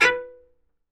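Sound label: B1 SPICCATO, CELLO; Northstar; New Gold VOL-2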